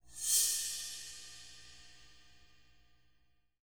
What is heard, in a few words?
BR CYM SCRAPE, drums; KITS-LARGE